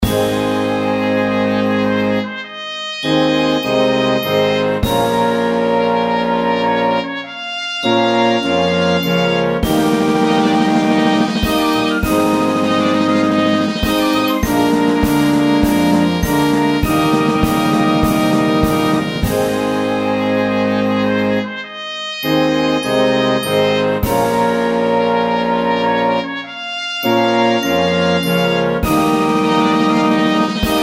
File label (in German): trompeten festfanfare